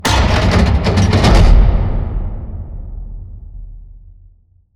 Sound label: close railroad track raise